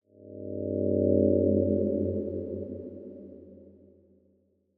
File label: cave, ambient; minecraft; SMCNV-pack